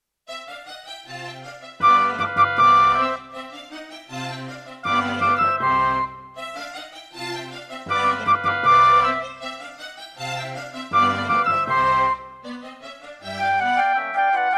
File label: Roland SC-55mkII; classical; Mozart; Concerto K467 partial